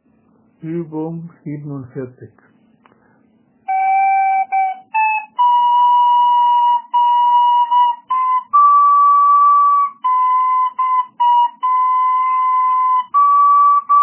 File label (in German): Flöten Übung